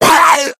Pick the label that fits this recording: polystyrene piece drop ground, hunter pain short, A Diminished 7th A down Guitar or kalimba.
hunter pain short